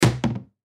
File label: Garbage Can Small Fall Hit Settle Short Cement; Plastic; Foley; Blastwave